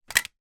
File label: household dvd discs drop on table